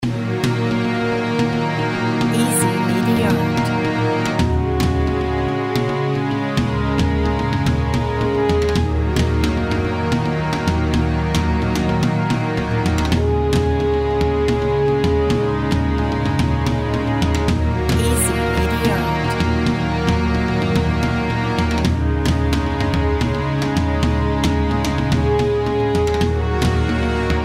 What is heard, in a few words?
epic